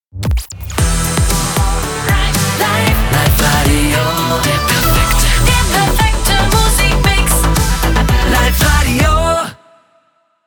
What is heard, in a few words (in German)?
Jingles Beispiel; Life Radio